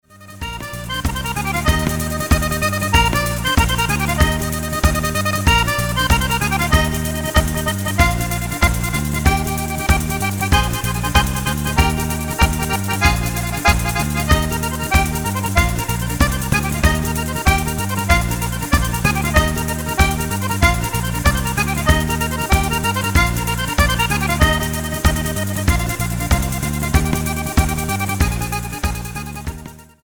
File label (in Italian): pizzica tarantata